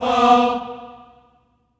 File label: Staccato F B3 R, Symphony of Voices; Spectrasonics